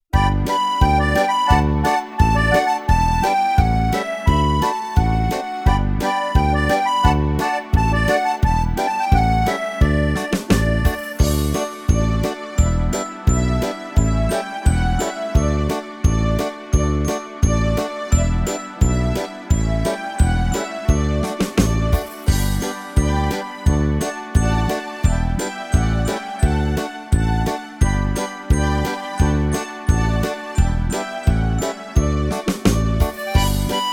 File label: Melody